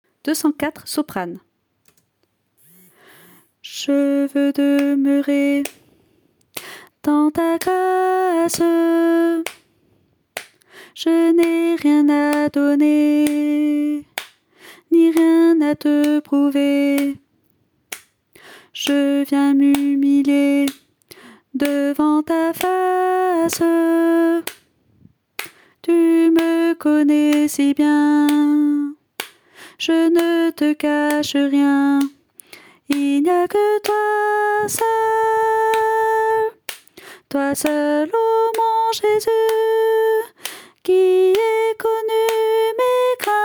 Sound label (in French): soprano